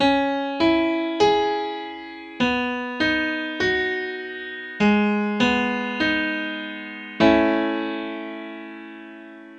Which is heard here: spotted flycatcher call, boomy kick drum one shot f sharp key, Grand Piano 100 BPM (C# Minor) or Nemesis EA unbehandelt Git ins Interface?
Grand Piano 100 BPM (C# Minor)